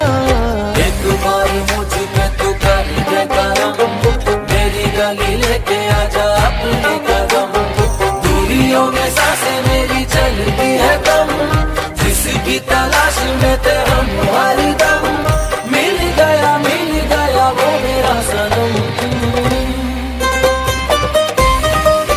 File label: Song Ringtone